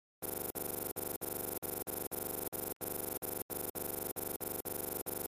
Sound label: Hard Drive Transfer, computers